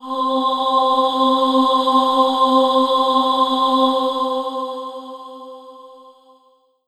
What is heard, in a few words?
Yamaha FS1r Vox Move C4